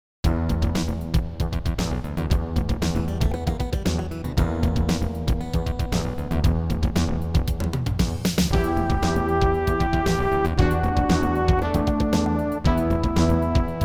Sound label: CAVERN (迷宮); All Sounds of Illusion City